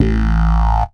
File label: Phaze Bass; Big Fish Audio; Old Skool House; OSH Bass